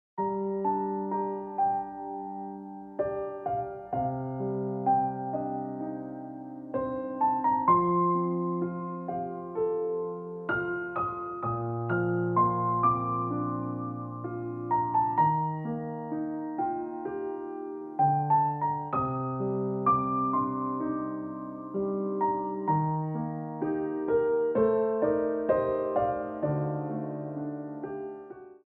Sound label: Ringtone Piano Music